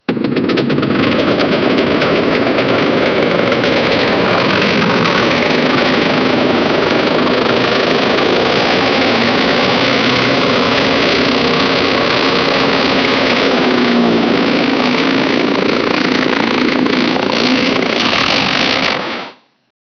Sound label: stuka bomber sound; air raid